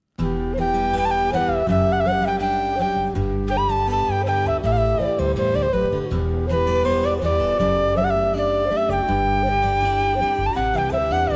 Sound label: Flute Version